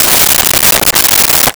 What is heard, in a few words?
Nails Box Empty; Tools; 1001 Sound Effects